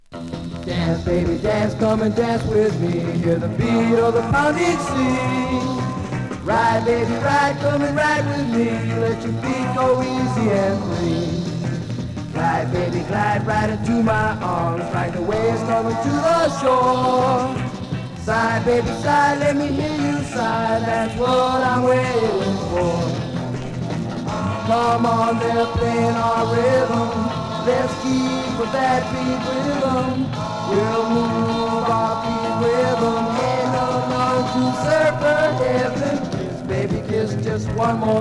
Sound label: beach